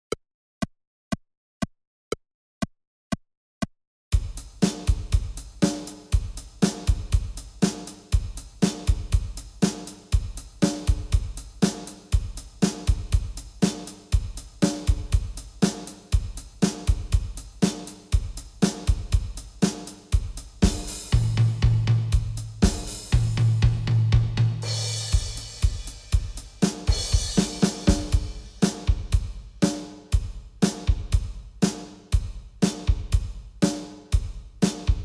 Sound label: Percussion Mix